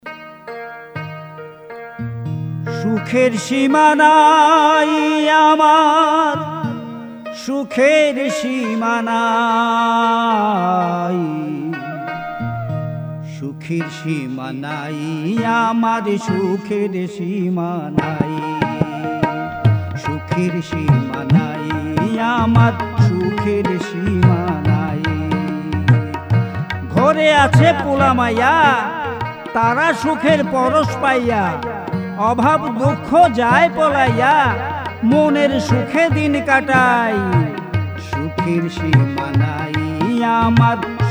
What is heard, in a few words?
jingle